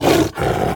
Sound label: roar